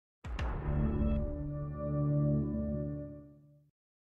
netflix intro sound effect

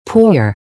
REEEEEeeee

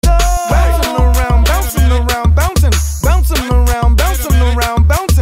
dance

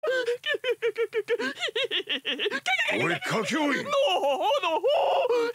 NOOHOHOODOHOOO

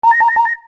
low health; paper mario

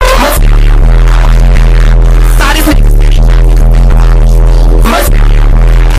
earrape

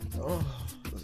moan

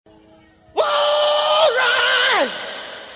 yell

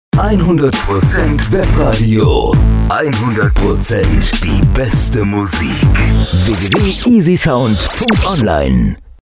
webradio easysound jingle

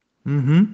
uhum

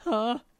moan

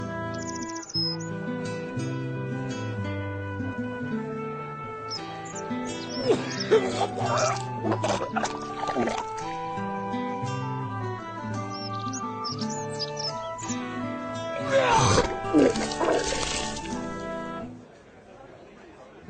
peaceful music

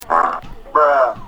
fart noise bruh

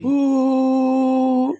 uuhhh

